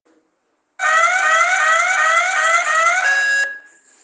industrial, sirene; 27C10 NOBREAK

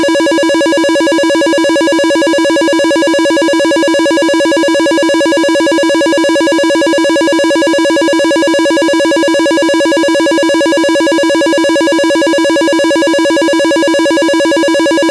ringer continuos ringing tone; DP3000

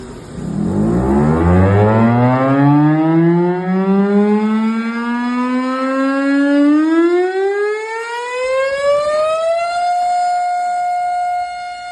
TITAN, sirene eletromecanica; TITAN 2; Engesig Sinalização e Veículos Especiais; incendio

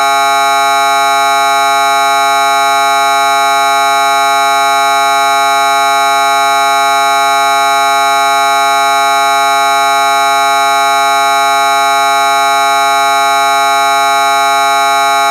buzzer tone; DP3000

